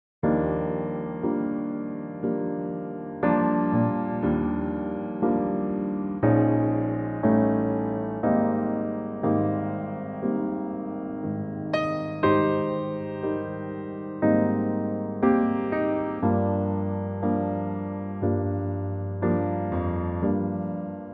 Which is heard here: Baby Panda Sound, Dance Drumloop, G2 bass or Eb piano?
Eb piano